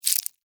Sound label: Insect, Claw, Small, Snap, Movement, Bug; Sound Effects:Animals:Insects; Blastwave